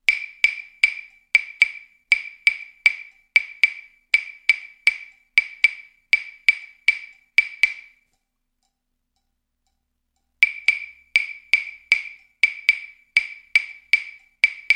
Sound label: Clave Rhythms